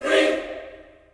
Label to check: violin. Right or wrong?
Wrong